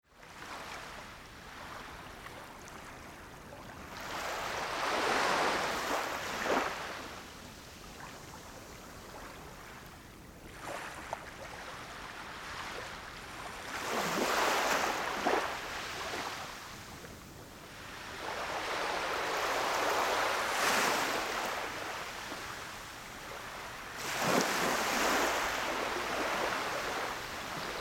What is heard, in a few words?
wave